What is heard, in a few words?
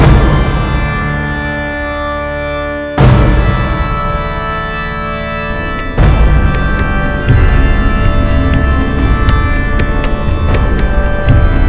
Gothic Ambient; Gore: Ultimate Soldier